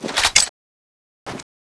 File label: shoulder reload, dual uzi; tfa cso